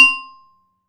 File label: SHORT VIBRA C3; AKAI S-Series CD-ROM Sound Library VOL-7